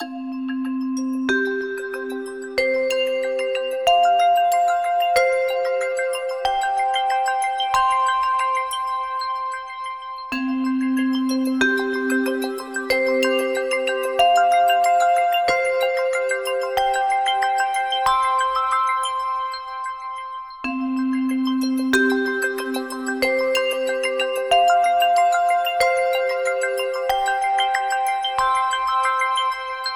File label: Iron Melody, alarms; Honor Pad X7